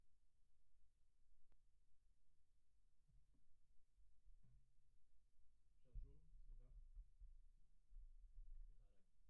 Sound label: Kick In, 96bpm